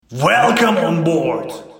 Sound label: vokal